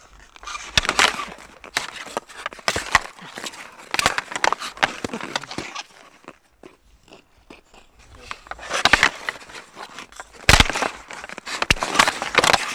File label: Hockey Movement With Sticks; Sports & Recreation